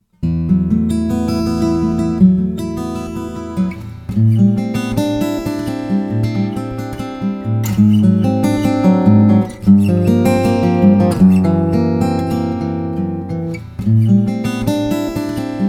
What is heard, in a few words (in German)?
Fingerstyle Piezo und Mikrofon